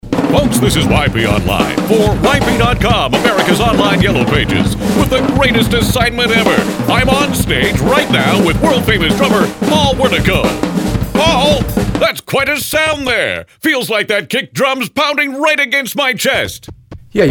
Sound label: Drummer